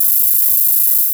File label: 808 Hat